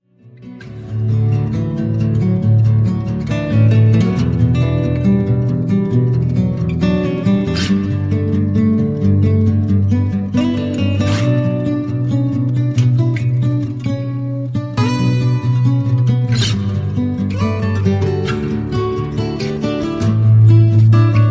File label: acoustic